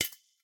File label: Sugar Drop; Game rip; MiSide